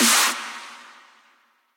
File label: clap